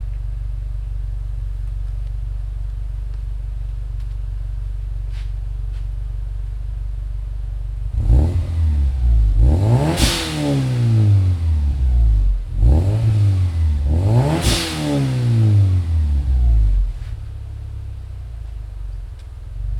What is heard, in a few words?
Subaru Legacy GT GFB BOV Exterior Rear Stationary; Leinninger; 2011